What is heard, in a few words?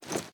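submachine gun reload fast mag hit; reloads; iw8; gmod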